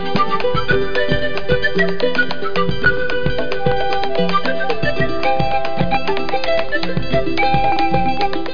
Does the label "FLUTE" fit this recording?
Yes